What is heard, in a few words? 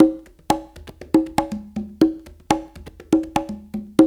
DNCE, World Dance, Dance Coil; Spectrasonics; Supreme Beats